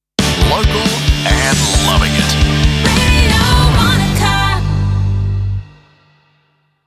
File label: Jingle Sting; Radio Wanaka